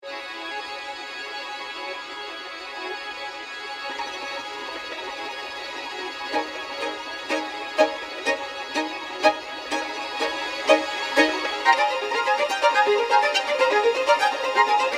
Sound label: violin; violintz; complete extended techniques collection; elastic sounds